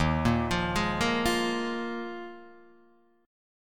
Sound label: D sharp Suspended 2nd Suspended 4th D sharp Guitar Open D